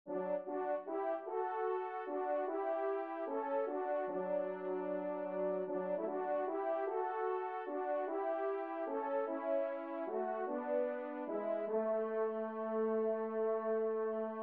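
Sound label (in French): duo, ensemble, trompe cors; Kling; duo cors n 28 29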